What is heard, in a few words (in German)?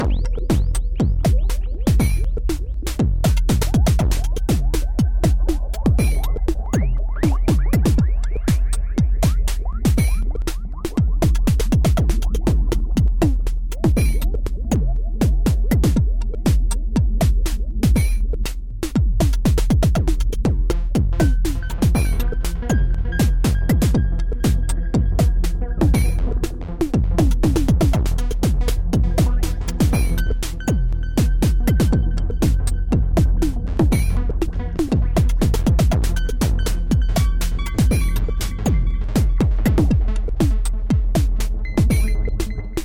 drumsequenzer auszug